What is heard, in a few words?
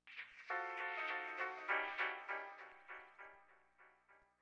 Stab; Thirty-One Flavors